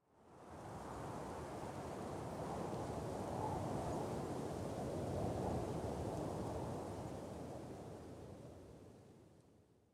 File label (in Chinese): 灵魂沙峡谷风声; ambient